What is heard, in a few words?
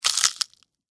Crunch Celery; Texture; Crunches; Objects; BlastWave